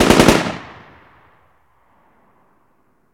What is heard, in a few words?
assault rifle distant; Zomboid 41.78.16; Project Zomboid